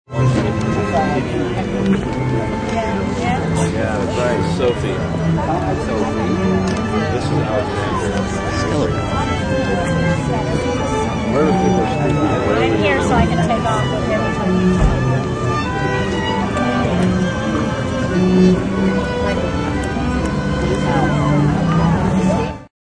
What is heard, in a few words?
String quartet and crowds peacefully milling outside, Wedding Ceremony Background; String quartet and crowds peacefully milling outside before wedding ceremony; Banquets And Reception